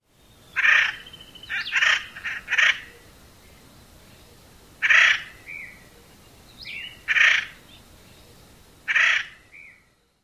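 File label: Acorn Woodpecker calls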